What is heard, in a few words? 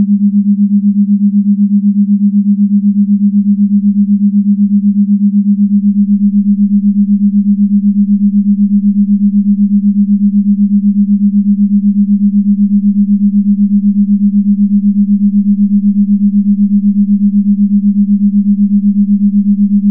8hz; ether resonance; tesla